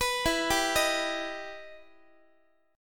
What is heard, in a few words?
E Minor Major 7th E down Guitar; EmM7 arpeggiated; x,x,14,14,11,14